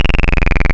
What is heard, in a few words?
VV Sallen Key PWM, wavetables; Animoog